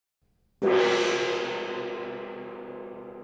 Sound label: middle, gong vibration